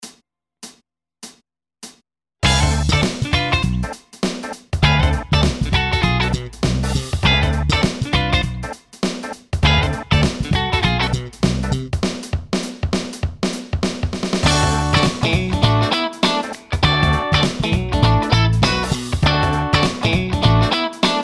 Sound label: licks funk